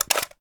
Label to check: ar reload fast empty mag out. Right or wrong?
Right